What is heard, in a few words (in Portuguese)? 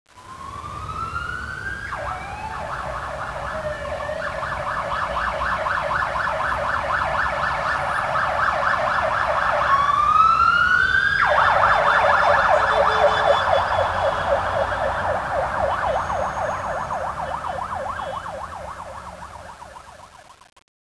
policia loop; cs rio tropa